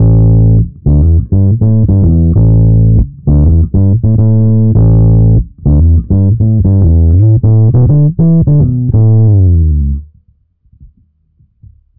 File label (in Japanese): OD 指弾き